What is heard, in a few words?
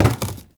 Carboard Box Impact; Warframe; Ambience; NineteenNinetyNine